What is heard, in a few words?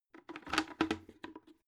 bucket handle lift up